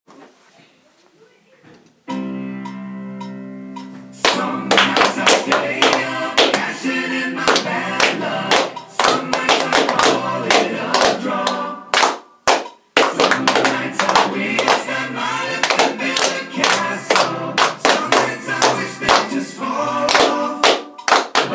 no backing with clapping

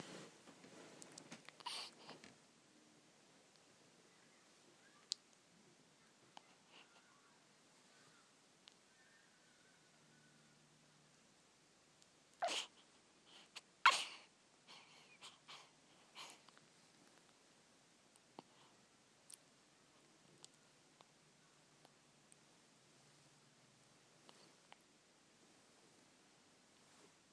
a sleepy stretch and yawn

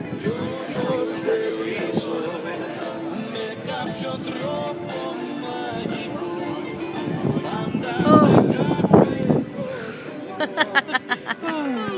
music at Byzantino Lindos

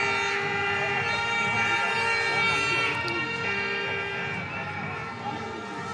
sounds from the ground

